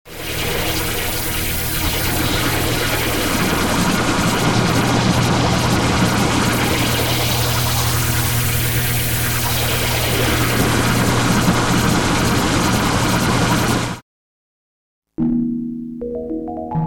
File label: Blofeld; Waldorf